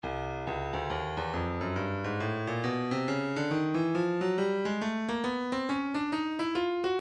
breakbeat midi files 065 069 bpm; Lowly A; Stylus Breakbeat Midi Files

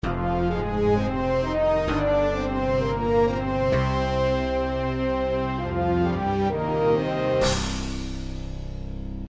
death jingle; nintendo who framed roger rabbit

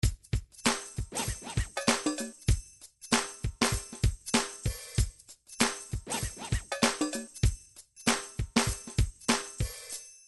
drum; song book

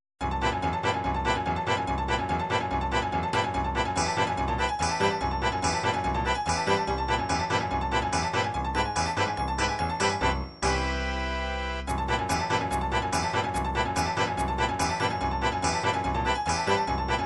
forro, midi

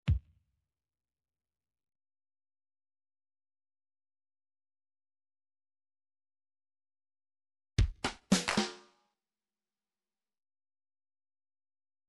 109 bottles, midi

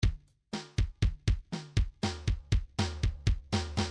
midi fills rock

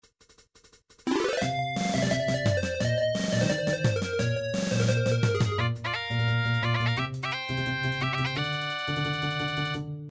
victory; nintendo mega man iv

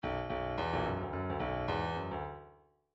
midi grooves funk

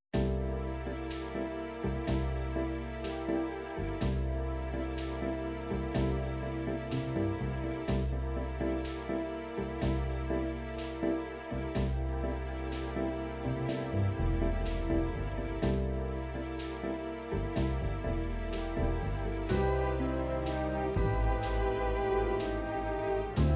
ballad

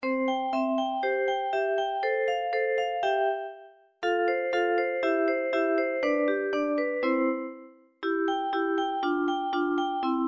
Super Nintendo music, midi; mario paint

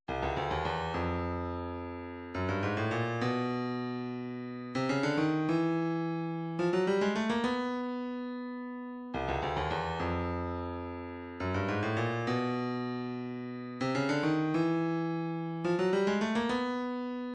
130 djembe

